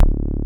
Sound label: SPIKE BASS; Masterbits; Dance Trax